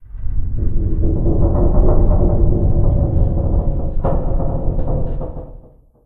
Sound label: Metal Stress; silent hill homecoming; ps3